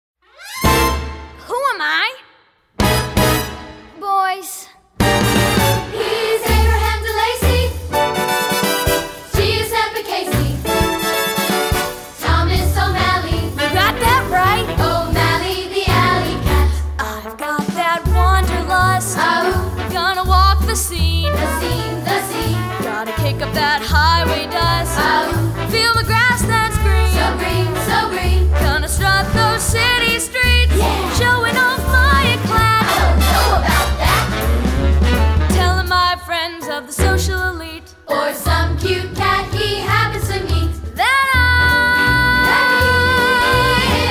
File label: Cat Guide Vocal; Thomas OMalley